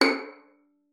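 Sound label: violin pizzicato C#5; Xsample VOL-5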